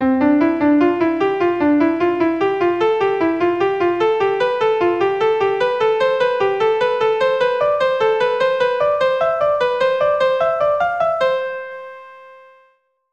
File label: Scale